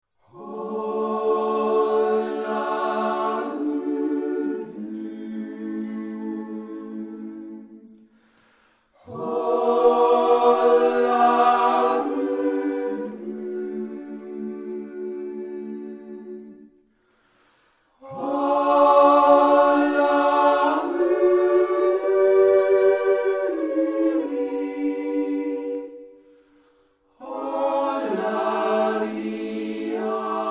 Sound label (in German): Andachtsjodler